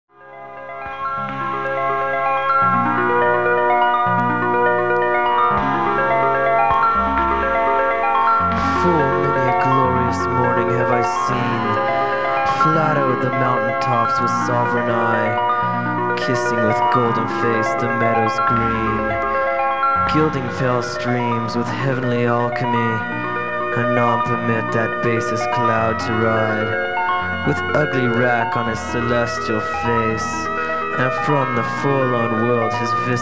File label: Poem background